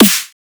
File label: Tek Atlantis Operator Snare G